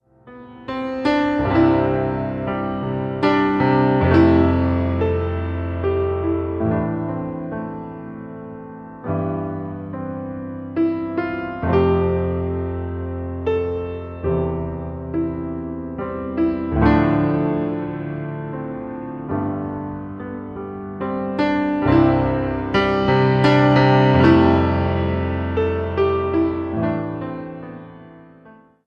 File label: danny boy piano